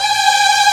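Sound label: MINOR TRILL G1, Strings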